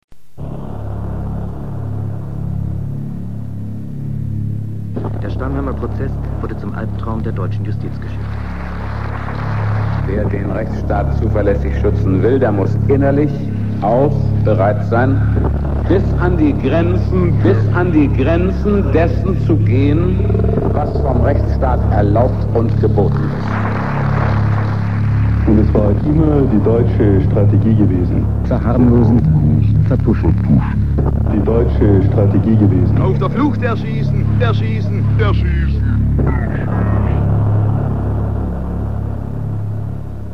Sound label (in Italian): intervallo; stammheim